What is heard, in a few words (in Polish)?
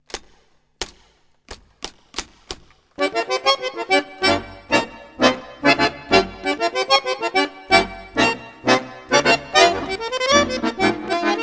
duet akordeonowy 432 hz; vlasov nichtwacht